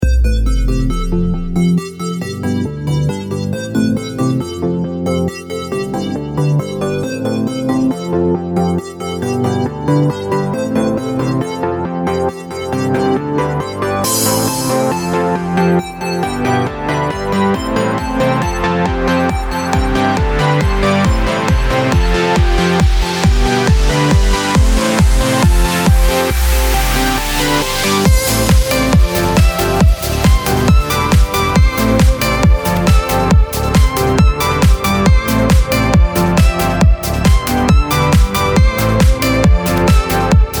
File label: fireworks